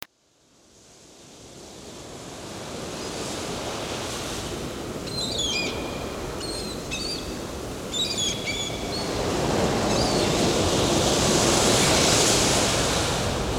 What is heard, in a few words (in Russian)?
Zvuki prirody Morskie pticy